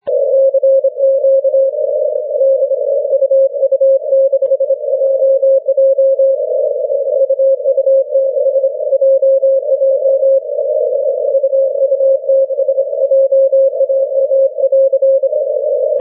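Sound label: 6m continuous wave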